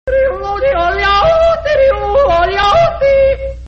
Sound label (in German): jodeln; hl2dm; oppressive territory